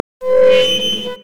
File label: brazier chains groan